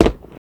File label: foot stone, footsteps; midnight guns